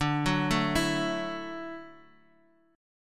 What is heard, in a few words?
D-Major Add 9th, D, 4G, 5C, 5E, 5A